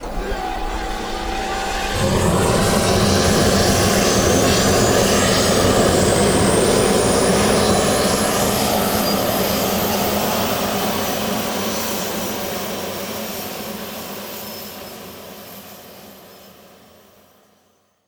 L-39 starter start, aircraft; DCS

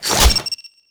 small armor

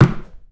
bone uaz hits cone single; Call of Duty Modern Warfare

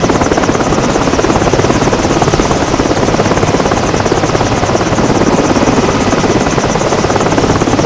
vehicle mi17 idle

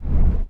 hacking interface move down

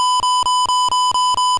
Cockpits RWR Launch Warning US; DCS

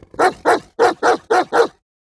bark sequence; Rust

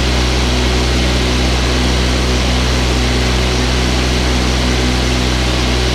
Diesel Engine Max; DCS

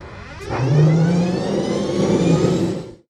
Aircrafts Engines APU Begin In; DCS

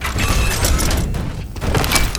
Rodeo Battery Beep; Titanfall 2

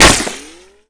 impact masonry; weapons; Modern Warfare

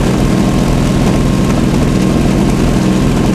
cromwell engine rpm 99 load

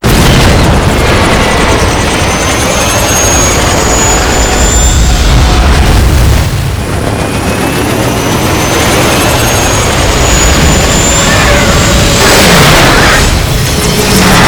cobra down

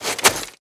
crawl, footsteps

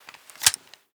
m92 clip in; Rust

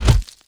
biomech impact light

